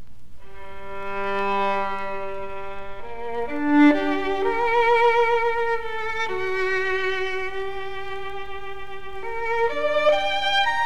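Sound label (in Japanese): Stradivarius, 擦弦; 弦鳴楽器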